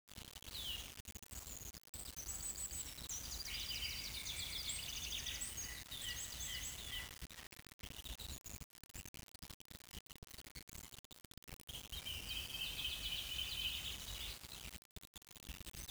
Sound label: birdies, ambience